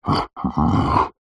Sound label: yeti monster grunt breathy; horror; zapsplat